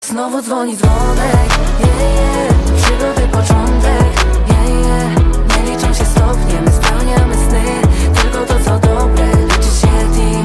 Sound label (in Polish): Dzwonek